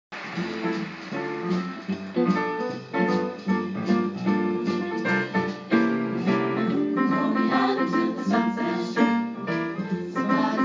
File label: alto piano